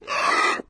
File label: stalker ambient; npc; source engine; episodic